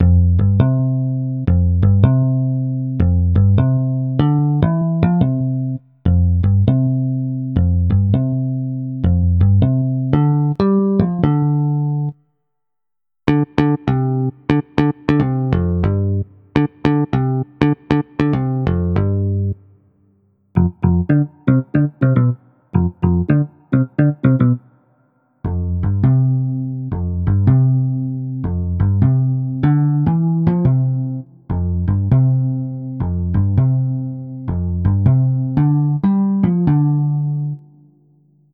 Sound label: Solo Electric Bass